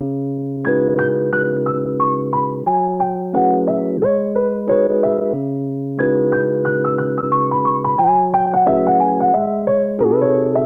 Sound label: Piano Dry 90 BPM C# Min; Melodics; Cymatics - Eternity Sample Pack